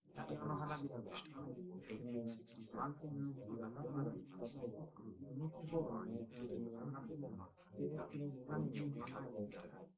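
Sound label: male, live recording 250ms 5cm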